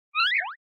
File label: Motion Pop